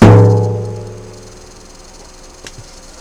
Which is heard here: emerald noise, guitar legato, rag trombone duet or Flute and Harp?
guitar legato